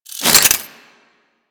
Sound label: Whip Equip; Grineer